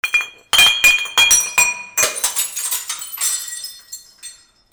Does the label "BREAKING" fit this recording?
Yes